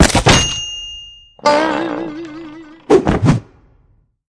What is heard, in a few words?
trap level fail; The Croods